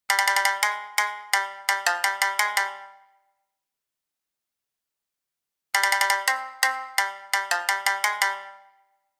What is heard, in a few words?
Jamisen; Jardin